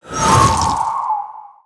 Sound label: windhawk death